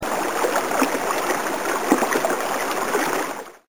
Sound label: small waterfall